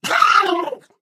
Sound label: ballbot blown up